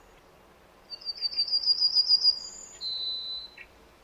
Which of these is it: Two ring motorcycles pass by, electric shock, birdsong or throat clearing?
birdsong